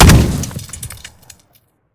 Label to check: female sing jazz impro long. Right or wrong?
Wrong